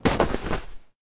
Hugging; LOOPERS

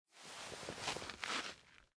Crouch; LOOPERS

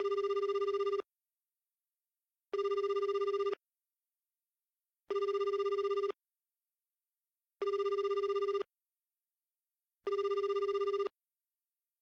Dial Tone; LOOPERS

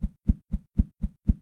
Wave A Hand; LOOPERS

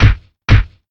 Headbutt; LOOPERS

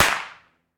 Cracker; LOOPERS

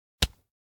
Touch A Hand; LOOPERS

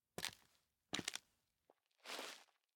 Look Back; LOOPERS

